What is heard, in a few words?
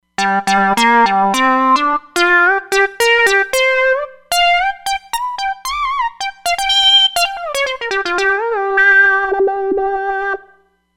Retro Mono